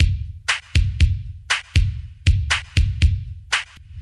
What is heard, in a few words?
Drum loops, Electro